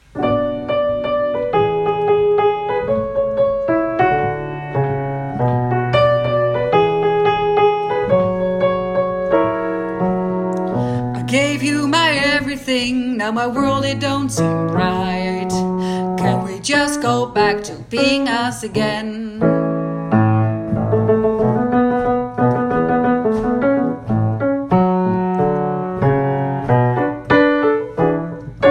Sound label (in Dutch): Rain tweede sopraan